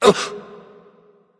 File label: surprised; smite; anubis